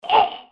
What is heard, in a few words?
hurt